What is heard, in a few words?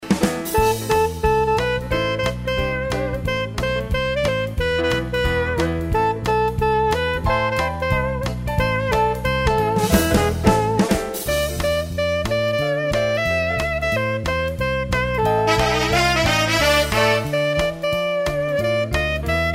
Big Band